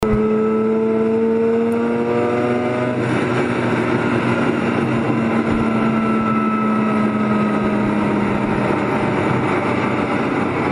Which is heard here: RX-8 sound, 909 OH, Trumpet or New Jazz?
RX-8 sound